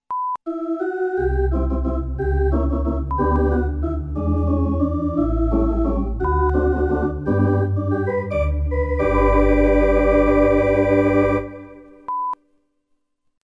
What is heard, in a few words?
baseball Music